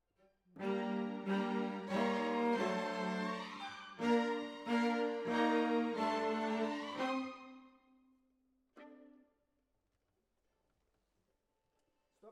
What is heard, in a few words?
Cellos